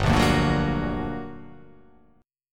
G Minor Major 7th G

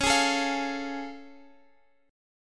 A Augmented Major 7th Db

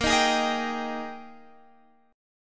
Ab Major 7th Suspended 2nd Bb, Guitar

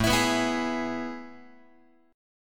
A Major 7th Suspended 2nd, Guitar; AM7sus2 strummed; x,5,7,9,8,7; JGuitar

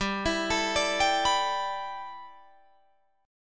G 6th Add 9th G Guitar Drop D, chord

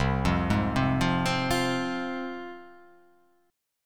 C Suspended 4th Sharp 5th, C, down, chord

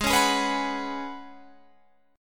Gb Suspended 2nd Suspended 4th Ab

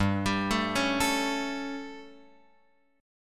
G Suspended 2nd Flat 5th G, chord; Gsus2b5 Chord {3 X 5 2 2 5} arpeggiated

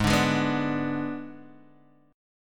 Ab Diminished Ab, Guitar, Standard; Abdim strummed; JGuitar

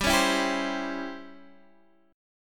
C sharp Minor Major 7th Flat 5th, chord

